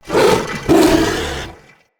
Corrupted Battle Dog Attack Bite Double, Animals, Vocals